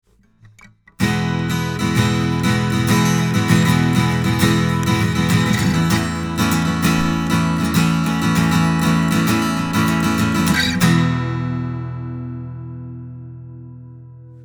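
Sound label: KM 184 Steel string High Z even; Dave Hill Designs Europa 1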